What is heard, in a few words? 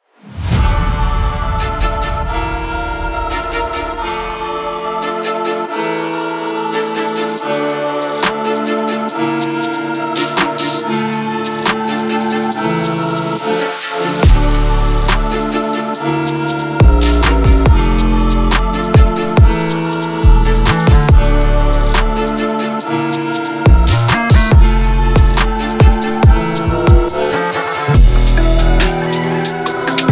Drum Kit; Digit Music; Serial Drillers